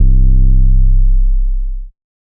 808 Oneshot Beef C; Loop, WAV

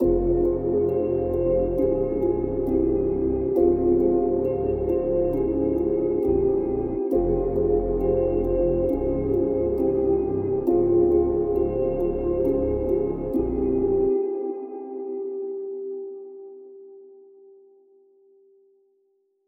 Melody Cm 135

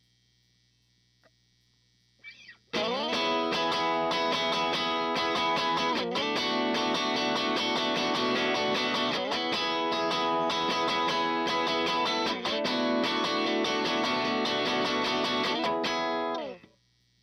Guitar 152bpm E maj; Waco

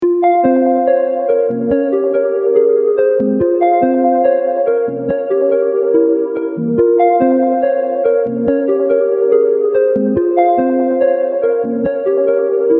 Pendulum

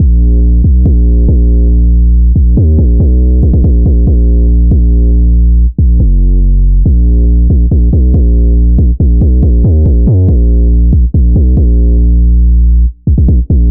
808 Pill Loop

